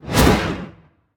angelic basic swing; smite; thanatos

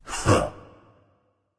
vox scoff; argus; smite; voicepack; hera

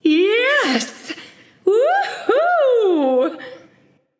yay; smite; pele

